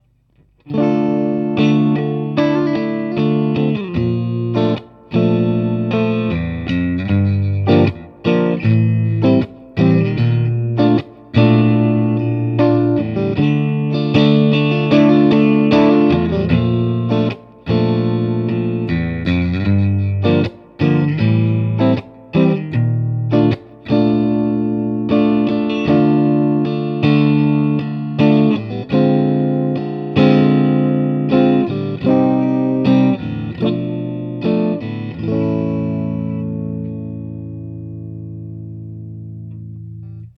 Mitte Hals

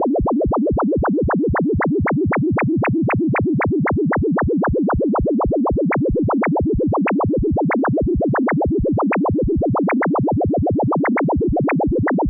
Studio Electronics STE.16 LFO Amplitudenmodulation

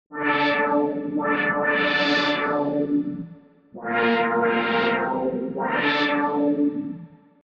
E-Gitarre Shimmer Ensemble

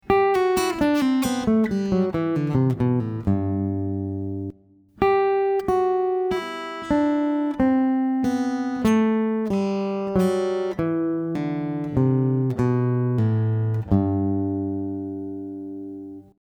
G Dur akk.

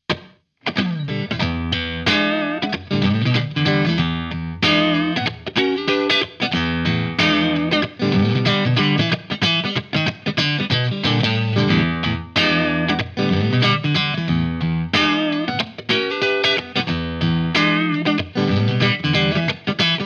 Ampman Serie Clean

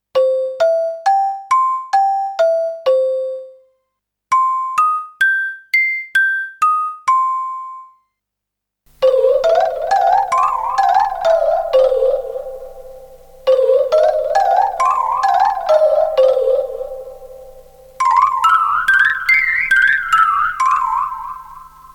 Casio Marimba erst trocken dann Shifter Hall Delay; FieldKitFX

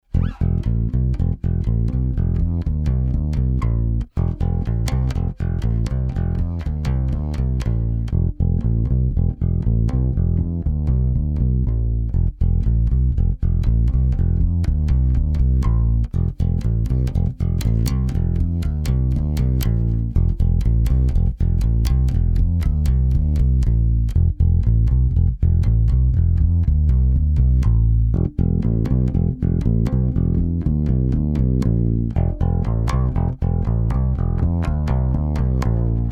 Eich T1000 Grundsounds mit Godin Fretlessbass

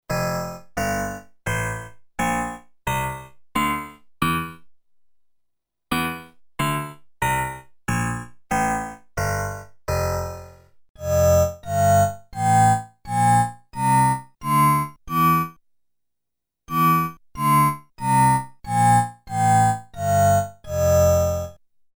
Emax Piano 10Khz multitrans vorher nachher

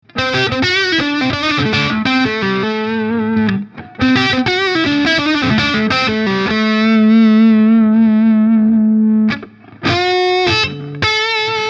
Hals verzerrt Voice Umschaltung

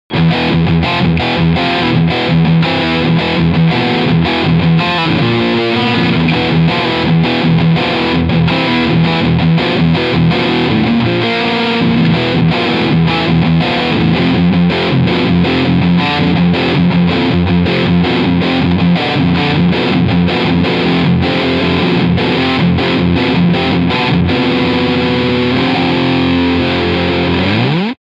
ESP LTD M 400 SOLFD Riffsound Steg PU

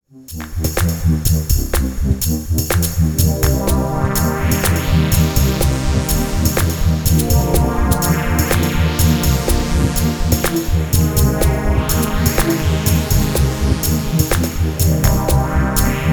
Zynthian Open Sound Plattform Ensemble OBxd Reverb

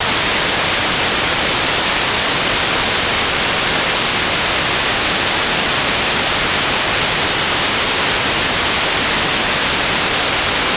Rauschen; Bullfrog

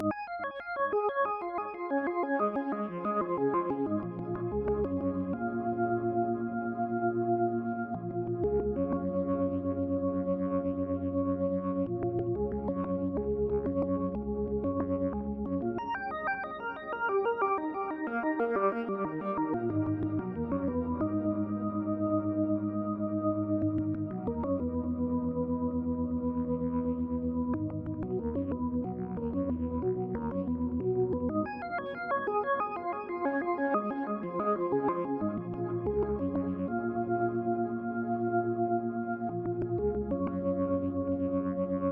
TC2290 DT Orgel Pearls

